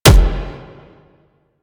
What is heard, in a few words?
Explosion Punch Generic Medium; Warframe; Weapons